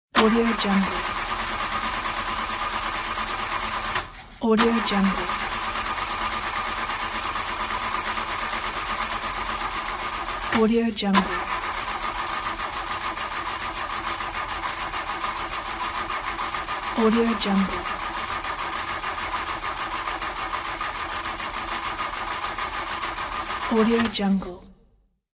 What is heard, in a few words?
car wont start